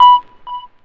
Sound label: sonar